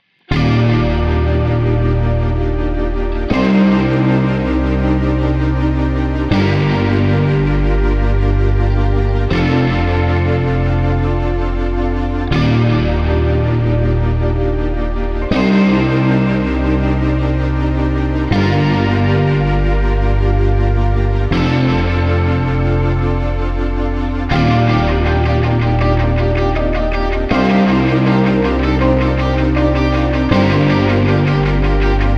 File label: without Click, backing tracks